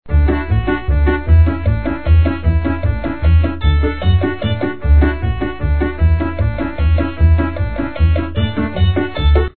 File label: Happy Dance